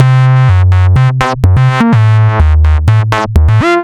Distorted Phunk, Bass; Sonic Foundry (Sony Creative Software); Underground UK House & Electro

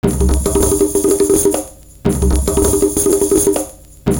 PERC THEATRE; Univers Sons - Kitch 70s

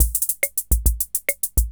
140 POPS; Best Service; XX Large Most Wanted; Sample CDs

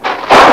Sml Crak, Weather

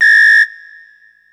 Whistle, Ensoniq ASR-X Pro

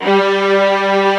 LO ST STRING

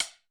Chopstix, Alesis D4; Efx

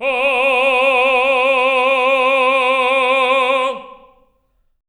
TEN F C4, Symphony of Voices, TENOR; Spectrasonics

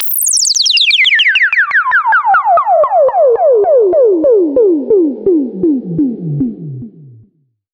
Sweeps Down

